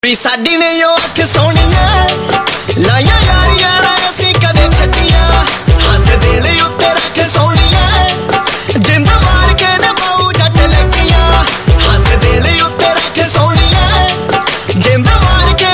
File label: Mobile tone